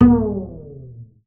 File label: SLIDE G2, BASS FINGER; Xsample VOL-5